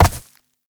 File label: Uriel Step Vegetation; Warframe; Movement